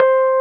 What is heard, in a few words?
SUIT AMP C4, Keyboards Old School; USB Soundscan vol.09; AKAI